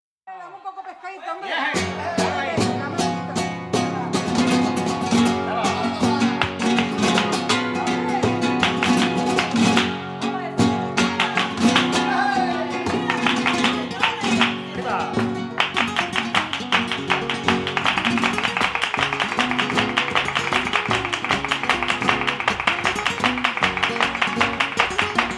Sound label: Spanish Flamenco